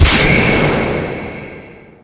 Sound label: bow; windrunner; powershot falcon